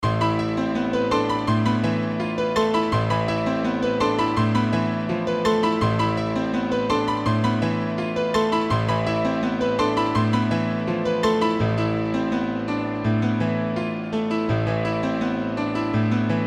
Piano Loop Cmin 166 BPM

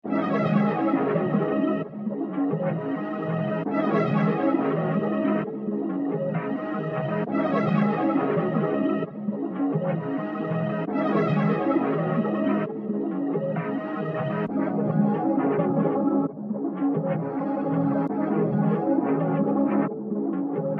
C min 133 BPM Atmospher Arp

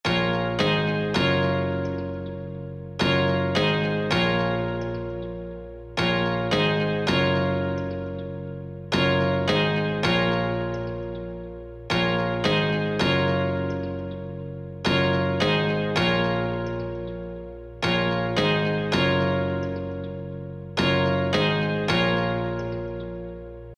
Melody Loop Amin 162 BPM Piano

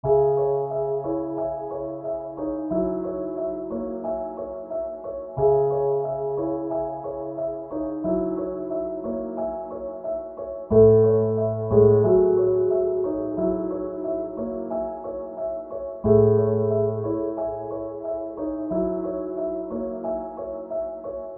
Lofi Loop Cm 90 BPM Piano

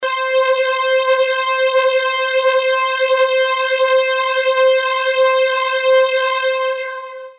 Lead One Shot

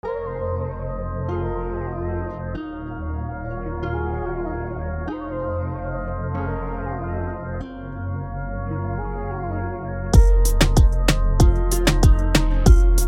Latin Melody Loop A 95 BPM

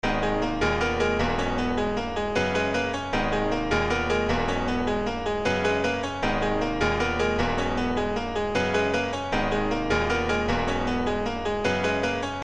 Melody Loop Gmin 155 BPM Piano

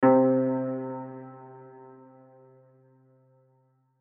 Keys One Shot

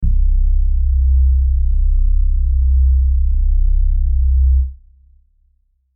Bass One Shot

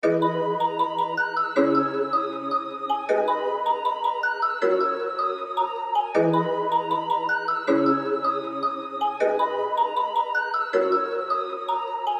G min 157 BPM Keys Plucks

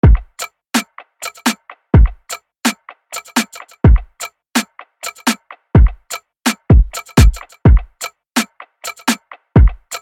Latin Melody Loop Emin 126 BPM Drums

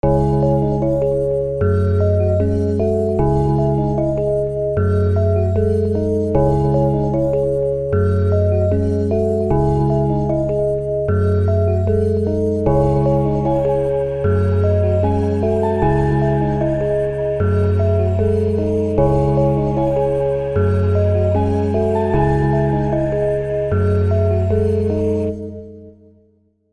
Melody Loop Cmin 152 BPM

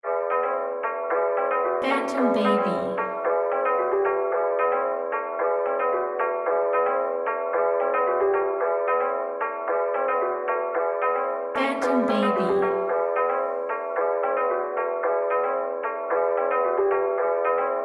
Melodica; Phantom48.Lab

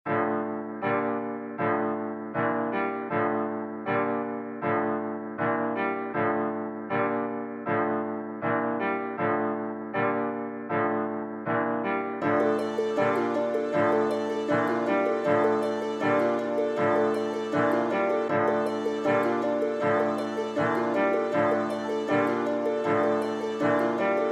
D min 158 BPM Piano Keys Harp Pad

